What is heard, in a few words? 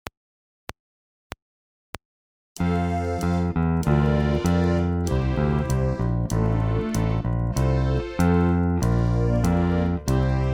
Baroque song; Dan Dean